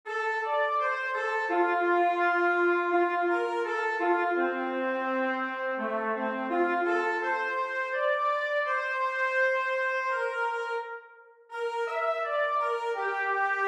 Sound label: trumpet lyrical; all state